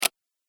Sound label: Pc Mouse Wheel; High Tech Sound Effects